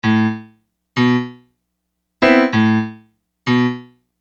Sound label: Acoustic Piano